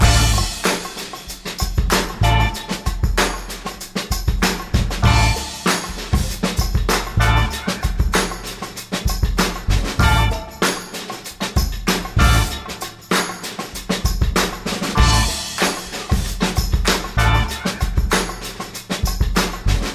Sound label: wet rain, drum loops, funky